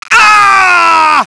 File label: death; sven; enemies; fallen officer